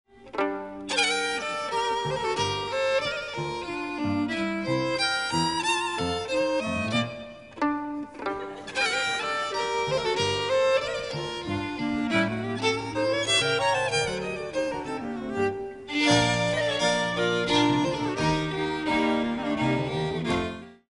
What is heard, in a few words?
Danska Polska Trio; NRK 1978; DUO